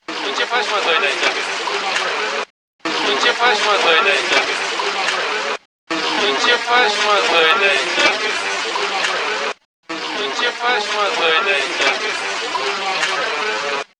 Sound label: Doina